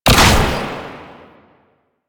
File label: Ranged Weapon Fire; Warframe; Necramech